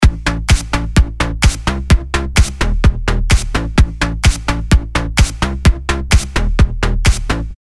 electro

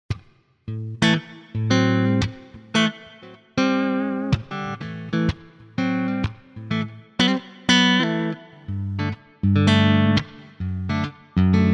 Ibanez QX54QM Pos. 3 Clean

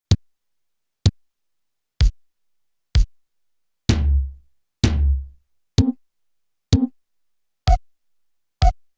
BD Sounds

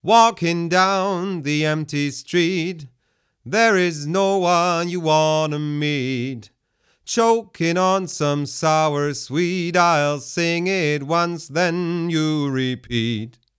Male Vocal; Tegeler Audio Vocal Leveler 500

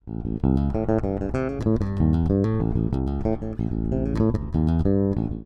e bass pro40

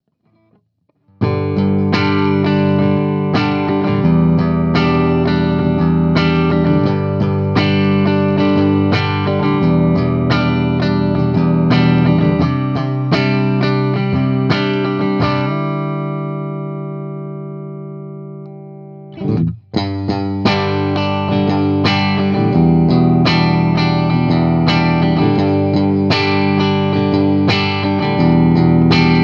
Clean Strumm

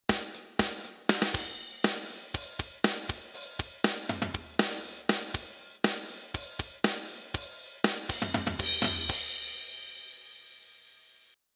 Loop DYN HiHat; Samplitude Pro X6

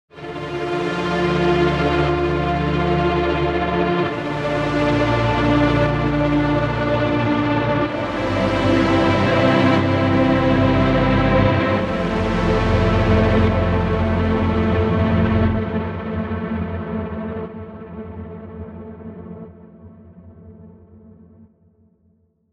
Analog Strings; Output; Grandiosity

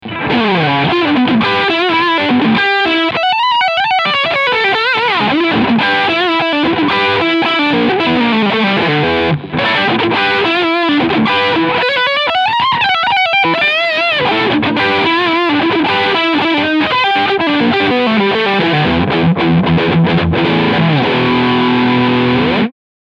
Normal Channel Lick

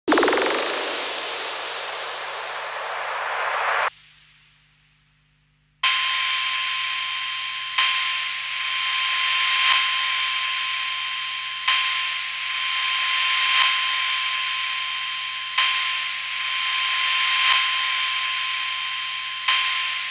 S100 Noise FX SEQ

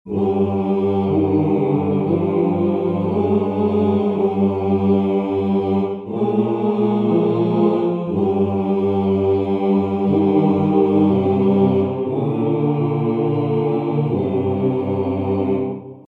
F BASS

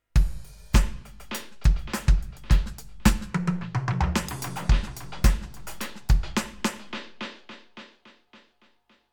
Roland TD07 Dub

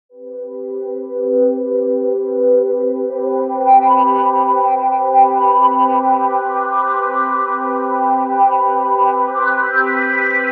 Singing Resonance; Waldorf Kyra

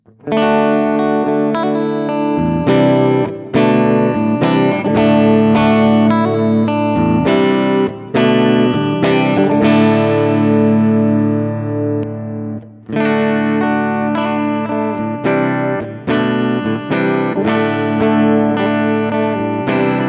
Ibanez RG631ALF Both Pickups Clean

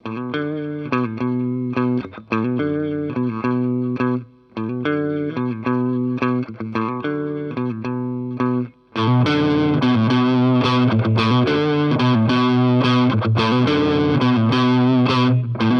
Boss OD 200 Blues OD

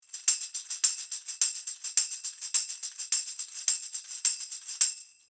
Shure SM7B Tambourin 1 Meter

